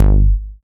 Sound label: TITE BASS; Masterbits; Dance Trax